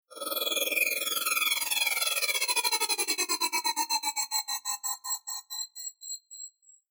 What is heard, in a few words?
Future Telemetry Silver Metal Glitch Glass By Shimmer Spaceship; Sound Design; Computer Sound Effects